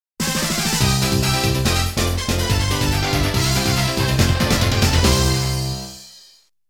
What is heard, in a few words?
Fanfare